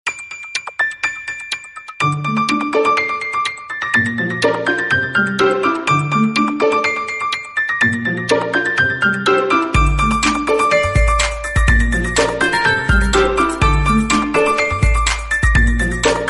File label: latest soft musical tone ringtone